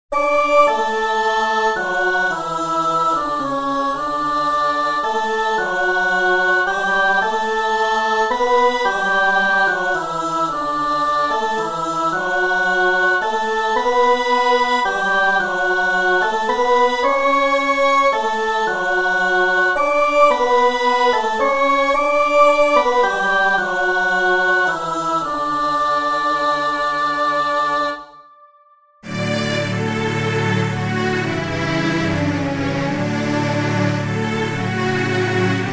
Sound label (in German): keyb; psalm 84